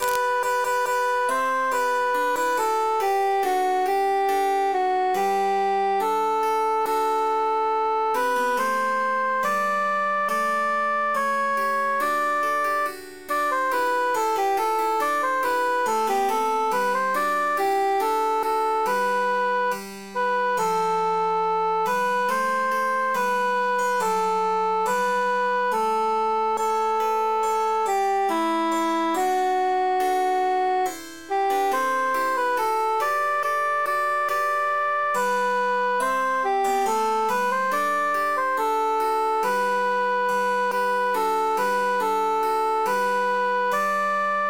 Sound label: altus emphasized; come merry lads